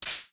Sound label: Z Target On; Toggle Z-Targeting